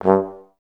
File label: G1, Advanced Orchestra; Best Service